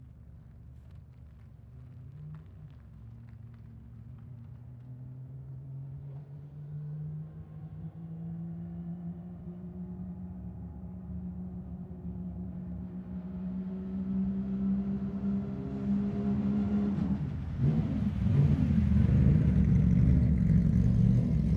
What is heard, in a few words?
AUTO RACE Porsche 911 GT3 Straight Away By; ec fields